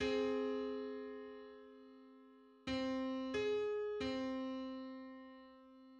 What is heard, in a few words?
Just minor sixth on C